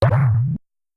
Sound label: box land; tf2ware ultimate; mariokart